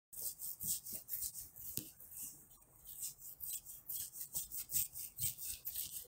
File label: itch; خاراندن بدن